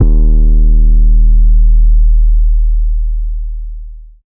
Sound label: 808 D#; Cymatics